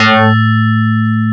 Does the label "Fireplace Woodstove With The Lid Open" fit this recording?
No